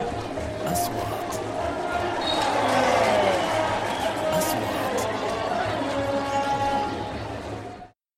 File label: Applause for football A match whistle